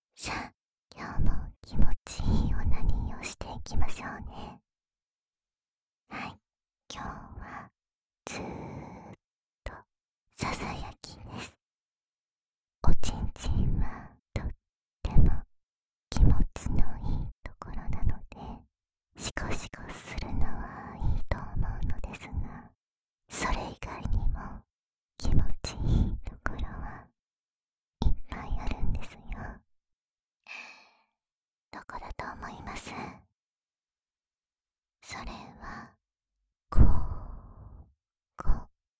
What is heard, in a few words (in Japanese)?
手淫 囁き ＆ 耳舐め; ひとりえっちをちょっぴりサポート